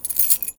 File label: Foley